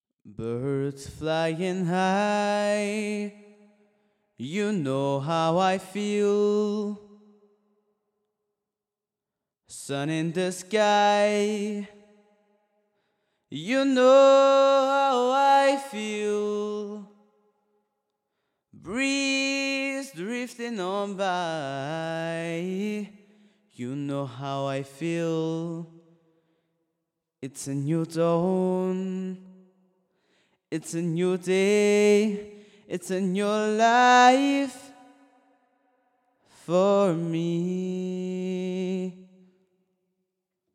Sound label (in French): voix solo; Mic Avantone C7+ avec reverb; voix homme